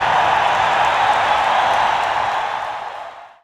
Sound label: APPL, XP APPLAUSE